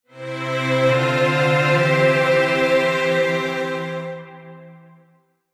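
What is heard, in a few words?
Strings Polyphony Harmony AB; Poly Hamo AB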